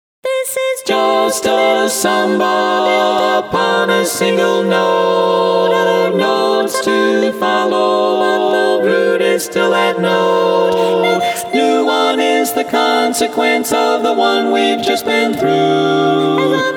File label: 4 part; learning tracks